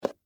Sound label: Game Piece Up, Metal, Pick, From, Board; Sports; Monopoly; BlastWave